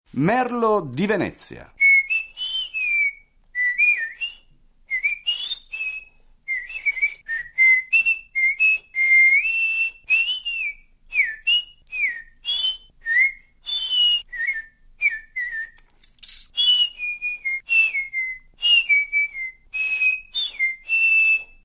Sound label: Italian blackbird